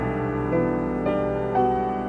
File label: 115 piano chord arpeggio; KEYS ORGAN PIANO LOOPS